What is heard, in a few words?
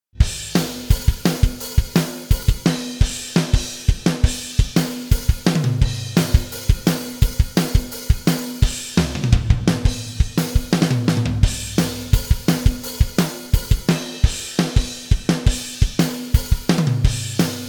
dry